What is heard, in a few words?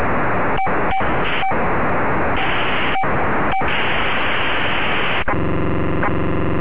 ermes, scanner